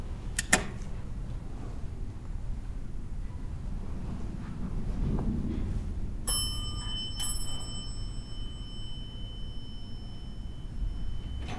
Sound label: hotel elevator